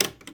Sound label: click typewriter; tortuga